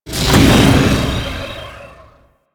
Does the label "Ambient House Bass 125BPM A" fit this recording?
No